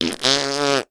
human mouth fart

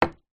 industrial rust spray can set down surface

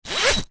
trampoline net zip open or close fast; sport; zapsplat

